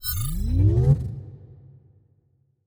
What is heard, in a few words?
cloaking activation button; Stellaris; first contact